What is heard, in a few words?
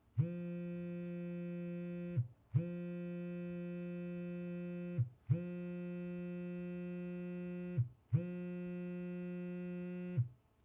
Cellphone Vibration On A Wooden Box